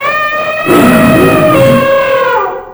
zoo

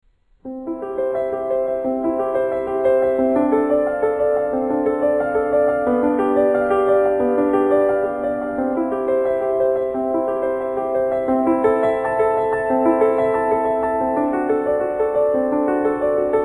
Piano Piece, Mellow

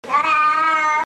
Cat hello

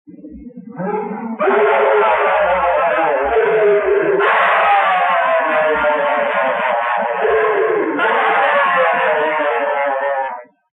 Scream; Twin Peaks

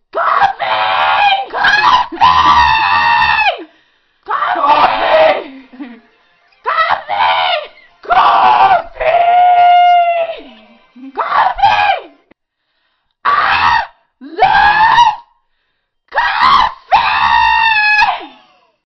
coffee spaz slow

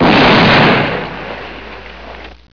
explosion

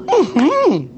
Mmmmhmmmm

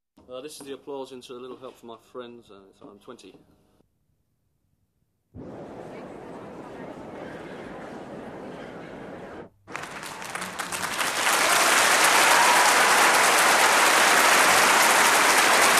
Applause

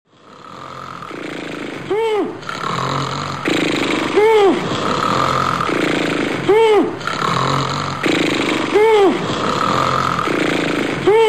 extended snore; Three Stooges

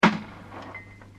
door slam

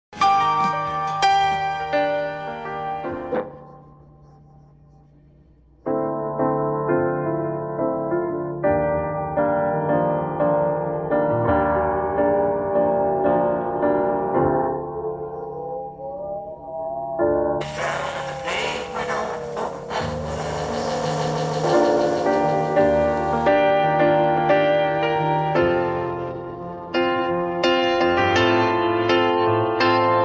no vocals; Faust; Phantom 2

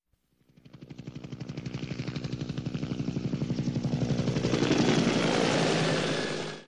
helicopter

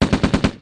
gunfire

